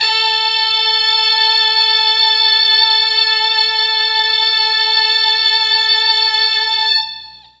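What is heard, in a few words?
A3 strings brass, mellotron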